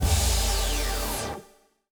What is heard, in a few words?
Jingle Warzone Rare Mission